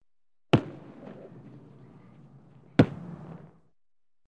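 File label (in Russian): football, sound of kicking the ball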